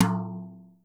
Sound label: BRSH; R70 KIT; Crypton - DrumBase5000; R70 KIT #1